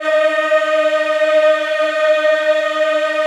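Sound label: D#4 men women eh, Choir; USB Soundscan vol.28; AKAI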